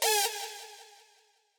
stab

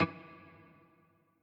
piano riff intro

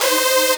synth

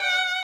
violin

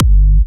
Kick; WAProd Free Kick Samples 2018; WA Kick Pack 1

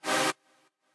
chord

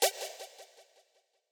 stab

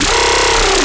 bass purge

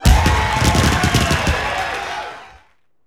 SE GAME ALL PERFECT; maimai

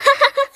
hahaha C#5; 音MAD; 五等分的花嫁; 三玖

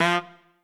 Trumpet melo

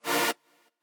chord

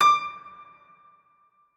piano

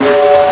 Big snare amplified; 神（59岁）

